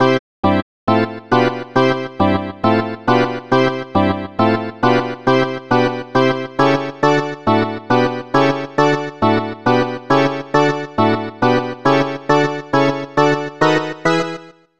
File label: Classic Baseball Organ; snes; super rbi baseball